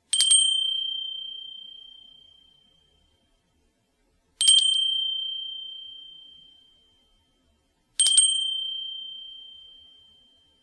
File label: rain flute haiku